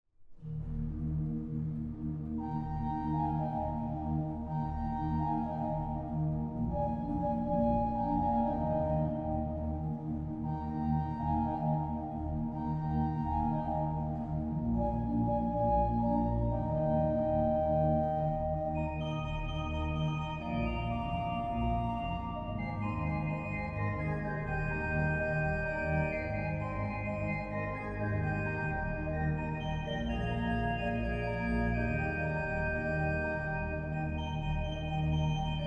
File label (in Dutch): orgel